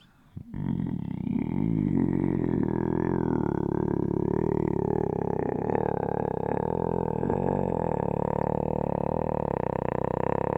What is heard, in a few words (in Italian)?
devocal aperture gutturali